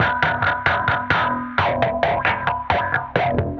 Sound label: Electric Bass; Basses